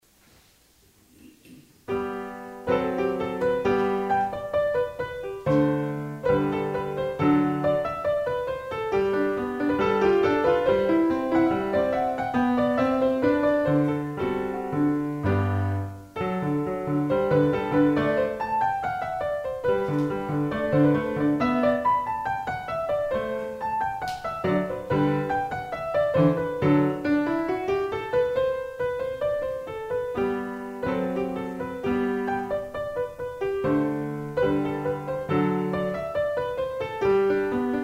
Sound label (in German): Sonatine G-Dur; Beethoven am Vulkan